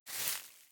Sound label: Thickgrass